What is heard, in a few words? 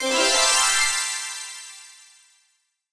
777 link; Seven Seven Seven